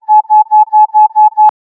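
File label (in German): warnton für einen astronau, warning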